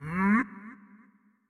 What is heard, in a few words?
Wind Up Short; Marv